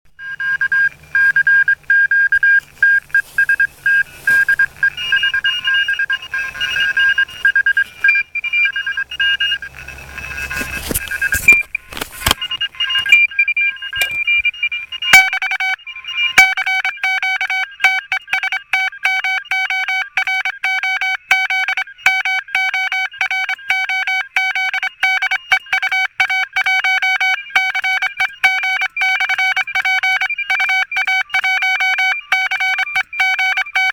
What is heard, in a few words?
RX